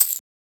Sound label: Shaker